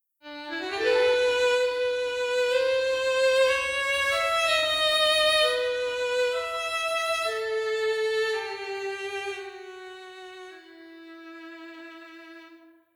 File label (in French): Violon trio